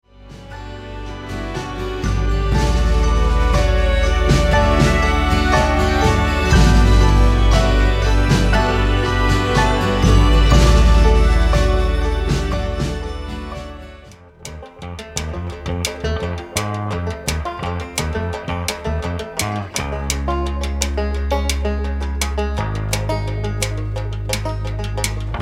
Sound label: Music No Vox; Demo Reel Music Licensing